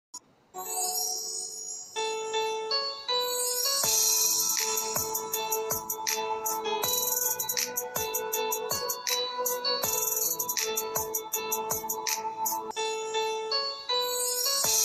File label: romantic instrumental ringtone; halfgirlfriend